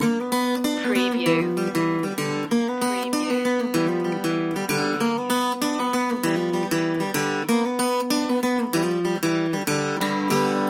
blues loop

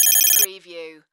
Beep Multiple Beeps; sound effects bible

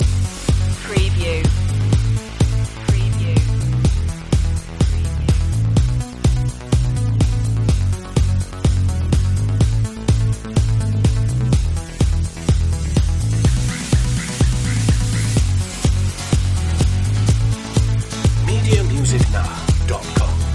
oh yeah loop

